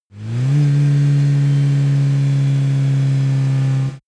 car donkervoort d8 gto brake high rpm

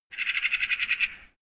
magpie clamore

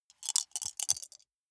small four rattle in hand closed short; wuerfel

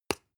spice jar small open short

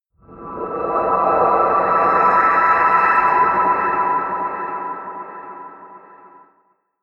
air wind choir high, drones

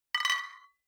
flip-top bottles clink multiple; gastronomie; berufe

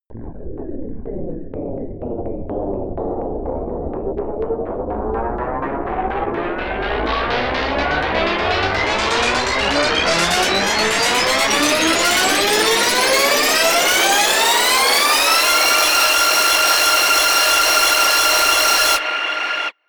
tape machine noise spin up, Risers; whooshes rises

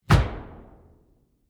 sci-fi shot bright; schusswaffen

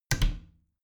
bathroom cabinet both close soft; objekte; tueren

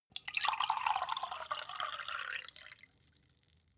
glass pour ring; soundpool

